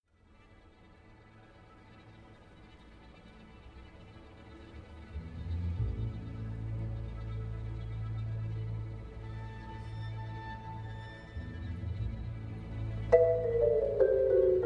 Concerto for marimba; Rosauro

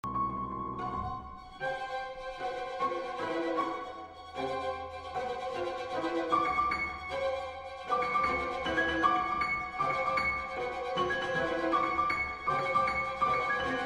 Piano concerto; McCabe

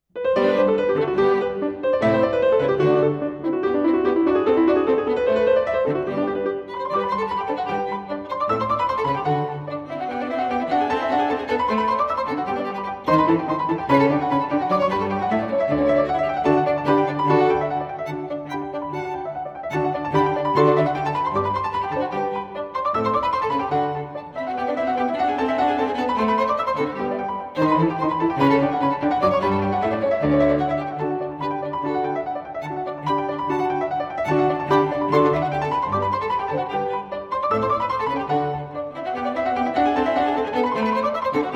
Piano trio; Haydn

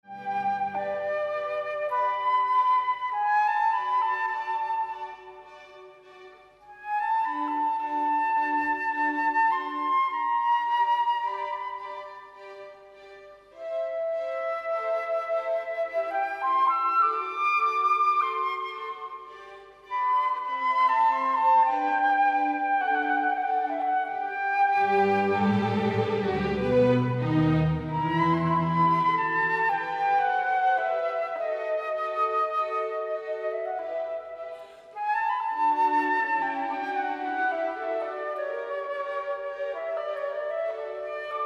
Mozart Flute Concerto No. 2

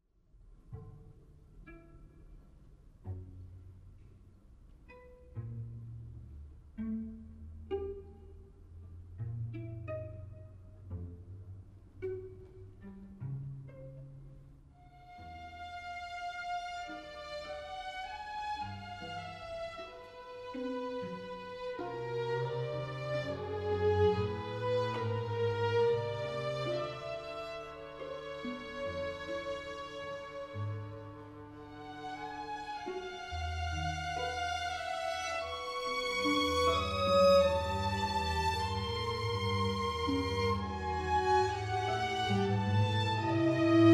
Prelude and Toccata; Bajoras

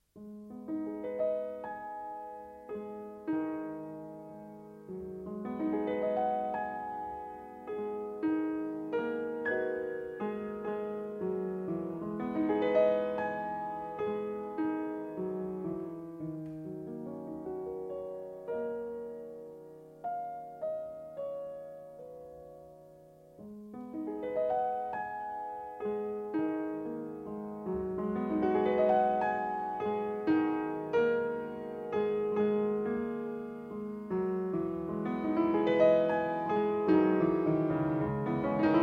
Tango; Makačinas

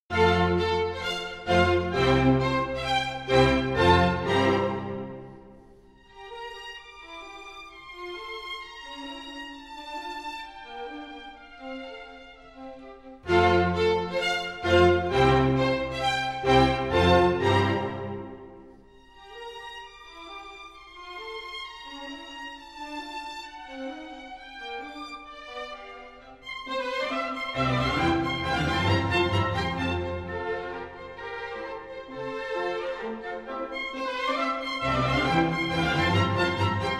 Concertos for Piano; Sondeckis; Mozart

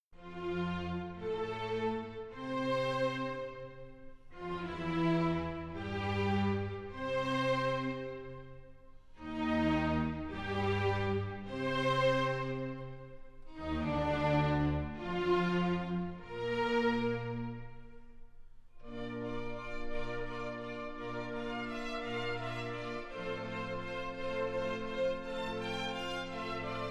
Concertos for Piano; Sondeckis; Mozart; Koncertas fortepijonui

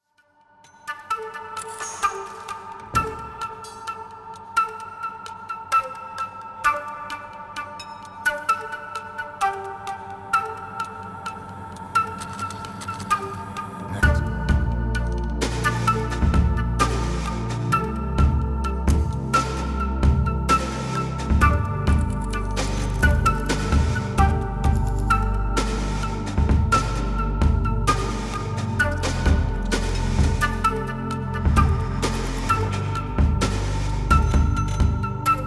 4 Flutes

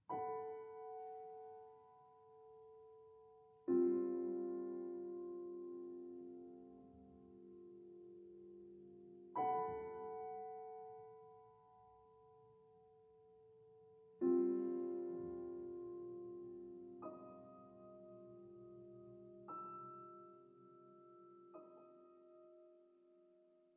Little Piano Pieces

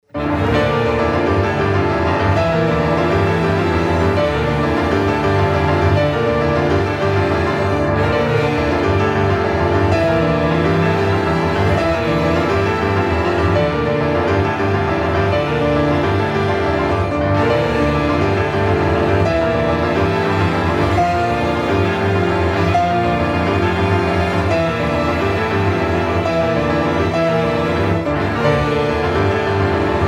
Harpsichord concerto; Gorecki

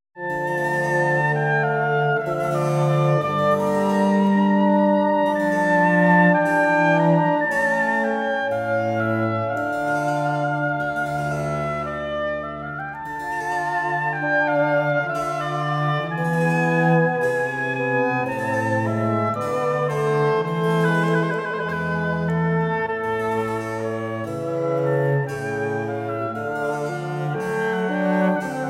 Trio sonata F-dur; Handel

